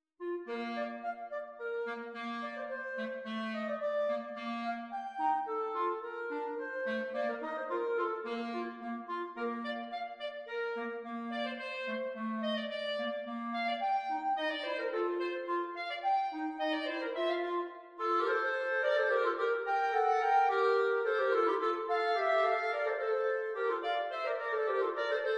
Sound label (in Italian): duetti per clarinetti